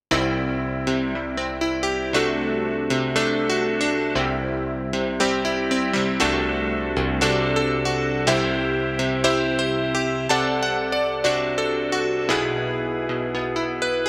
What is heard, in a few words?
cp80, yamaha tyros3